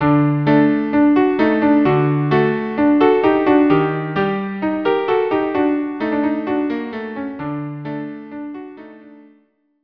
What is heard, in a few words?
hava nagila violin viola; hava nagila violin viola duo